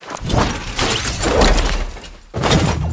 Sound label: poofy parasail sound effect